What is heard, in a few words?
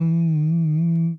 powerup